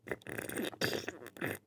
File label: glue slurp